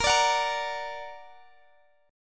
Bb Augmented Bb, Guitar

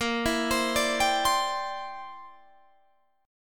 C Major Add 9th Bb down Guitar Standard; arpeggiated; Cadd9

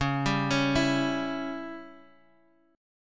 C sharp Diminished C sharp; down; chord shape

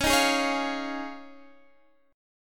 F Minor 7th Sharp 5th Db

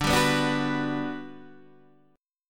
G Major 7th, D

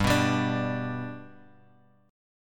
G Major G down Guitar Open G

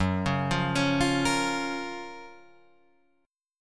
F sharp Major 7th, Guitar, Drop D

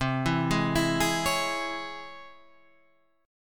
Db Minor Major 7th C

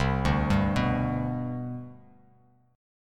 C Major 7th Suspended 2nd, C, down, Bass Standard; CM7sus2 arpeggiated; JGuitar

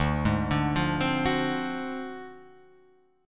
D 7th Sharp 9th Flat 5th D down, chord

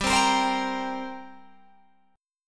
G Minor Double Flat 5th, Guitar, Open G

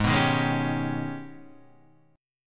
G sharp Minor Major 11th; x,x,6,4,3,3,3,4

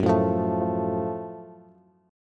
Gb Minor Major 7th Gb Bass; strummed; 14,12,15,14; JGuitar

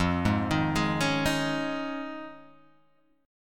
F Minor 7th Sharp 5th, Guitar, Open G; 3,1,1,1,2,1; down; chord shape

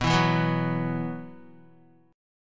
B Suspended 4th, Guitar; Bsus4 strummed; JGuitar

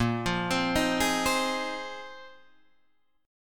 A sharp 9th Suspended 4th A sharp Guitar Drop D

